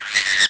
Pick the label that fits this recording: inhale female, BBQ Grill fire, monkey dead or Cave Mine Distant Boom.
monkey dead